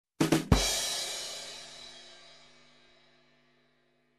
Drums after joke

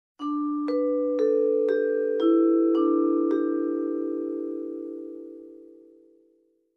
Simple vibraphone jingle melody variant

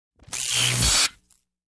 Electric Shock Buzz

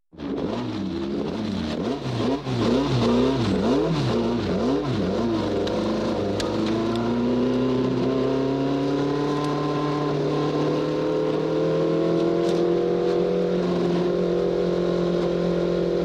Motorcycle Revving and Driving

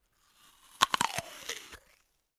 Fruit Bite

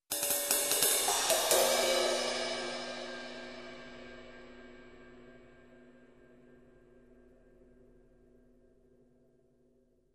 Fast Cymbal Hits

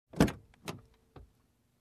Opening Car Door

